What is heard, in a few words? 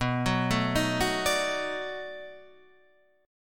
B 7th Sharp 9th, down, guitar; arpeggiated